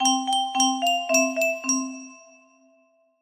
music box melody

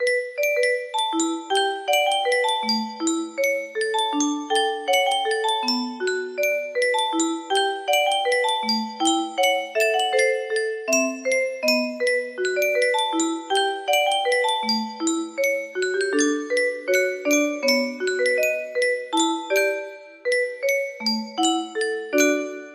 melody

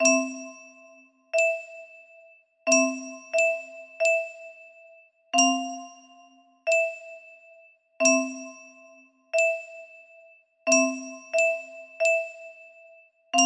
horror movie, music box melody

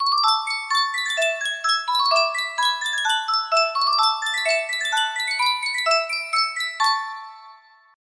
Sankyo miniature music box

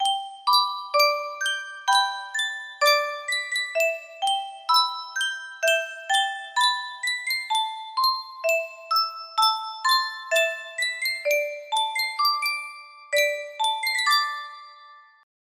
Sankyo music box

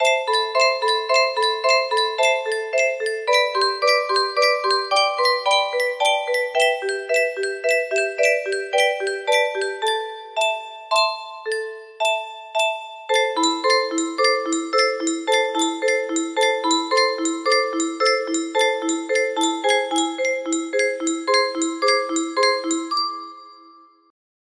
melody

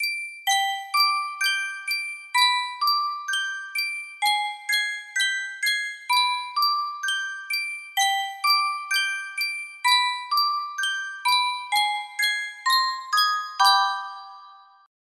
superstar music box

